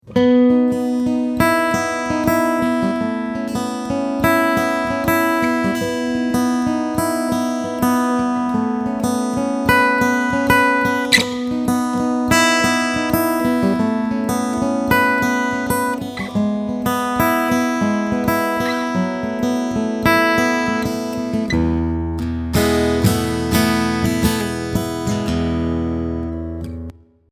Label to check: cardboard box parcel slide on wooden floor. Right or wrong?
Wrong